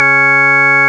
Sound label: CLICKY C#3, clicky organ